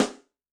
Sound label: Bary rim, Yamaha DTXpress-II snare